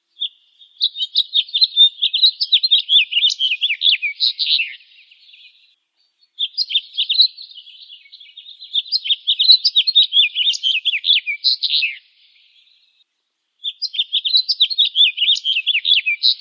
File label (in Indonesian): suara house finch